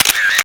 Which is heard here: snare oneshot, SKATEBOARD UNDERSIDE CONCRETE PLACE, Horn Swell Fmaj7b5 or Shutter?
Shutter